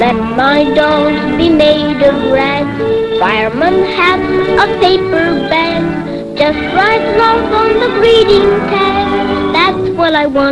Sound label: singing